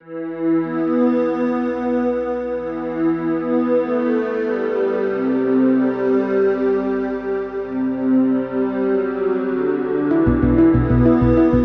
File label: Dark Ambient Melody